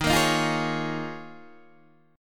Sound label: Eb 7th Suspended 2nd Suspended 4th Eb, Guitar Open D